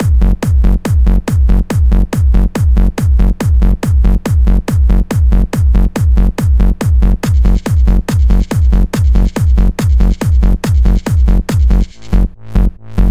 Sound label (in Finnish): Kova Beat